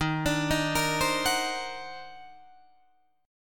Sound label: Eb Minor Major 13th, Guitar, DADGAD; EbmM13; arpeggiated